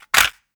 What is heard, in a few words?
Cassette Rattle